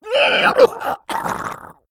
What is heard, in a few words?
Beast Murloc Death; Eagill